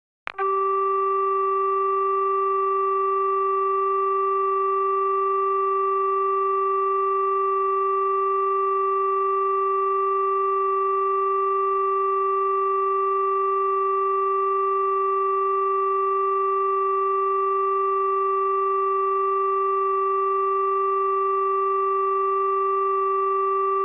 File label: BT Lines AND Tones, Number Uno; Computers Printers Phones; BBC Sound Effects Library